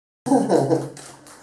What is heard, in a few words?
female wrestling; x1